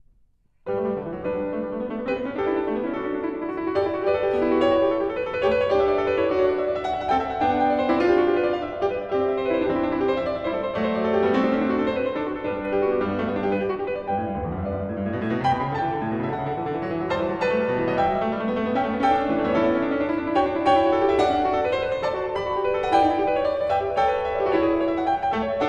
Bach Goldberg Variations BWV988 Variation 26 - Allegro